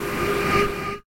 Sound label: enderchest open; Minetest; VoxeLibre